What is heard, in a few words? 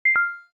Video Stop